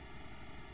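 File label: Farfisa Clarinet Noise, organ; Roland LCDP09 Keys of the 60s and 70s 1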